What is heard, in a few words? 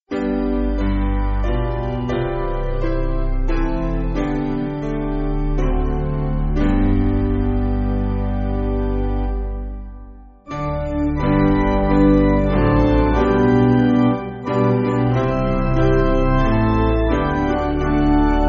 Piano, Music Backing